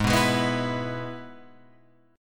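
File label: Ab Minor Sharp 5th chord, Guitar, Open F tuning; strummed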